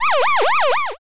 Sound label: confuse